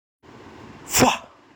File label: faaaaahhhhhhh